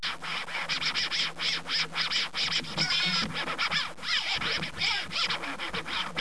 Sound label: scratch; Soccer Riot